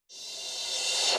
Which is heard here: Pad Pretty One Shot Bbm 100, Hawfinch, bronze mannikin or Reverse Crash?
Reverse Crash